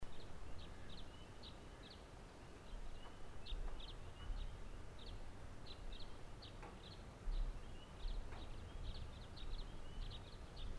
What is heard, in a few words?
Ellis Hollow Dawn, Bird Recordings